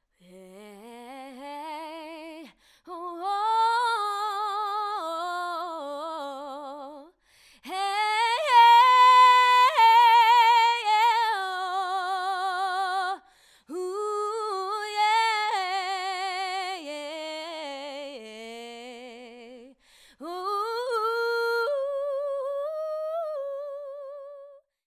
Female vox

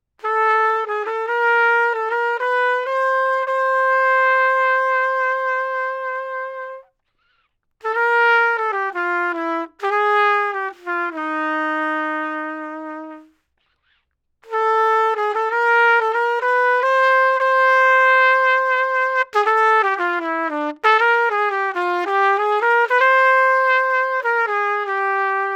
trumpet; FET

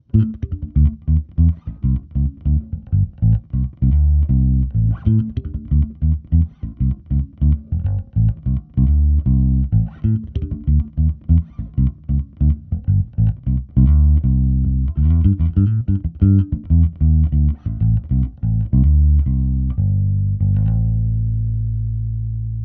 EL BASS; TUBE

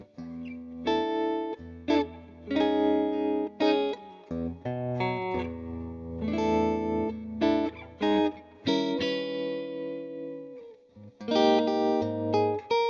electric guitar; Bomblet

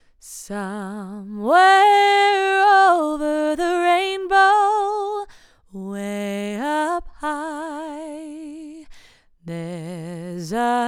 FEMALE VOX; BOMBLET

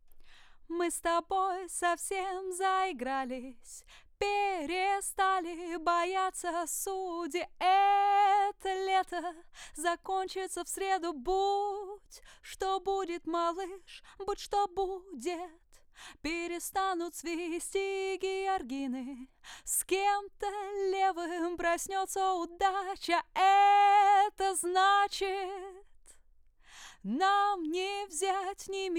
Telefunken ELAM 251E

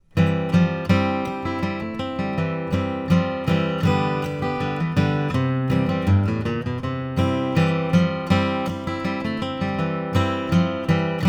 ACC GUITAR STEREO; FET

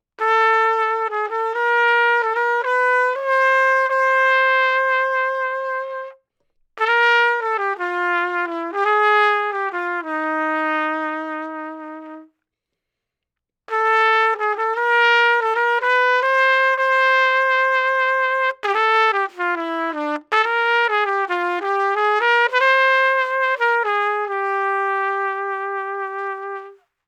TRUMPET TUBE

